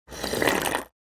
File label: Slurp Very Close Wet Slurp; Human Sounds